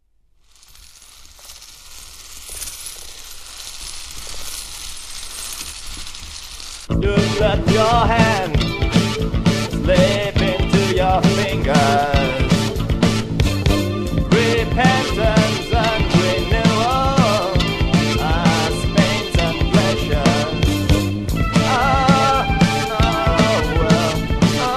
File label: tension